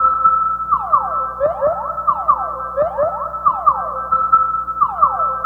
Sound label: Sonar FX; Sonic Foundry (Sony Creative Software) - Stylus Pressure Urban Grooves on Digital Wax; Matrix Z